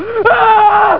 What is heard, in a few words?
argh